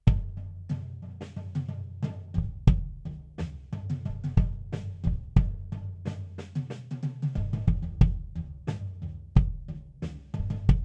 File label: Bass